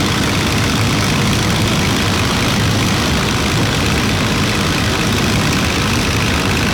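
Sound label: Plane P40 Interior Inflight Loop; Airplanes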